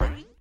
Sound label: Cartoon, Accent - Boing